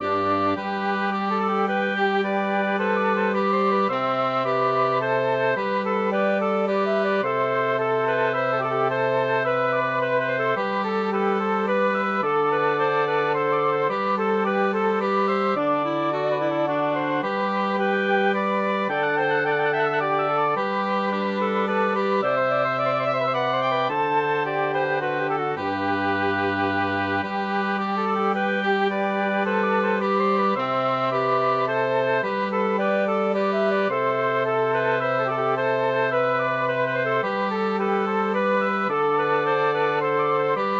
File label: for woodwind quartet; the gloucestershire wassail